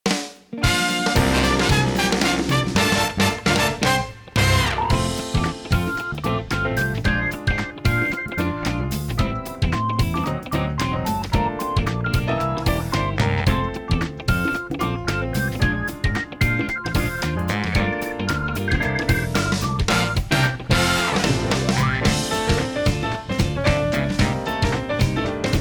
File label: Staff Credits Arrangement; NSMBW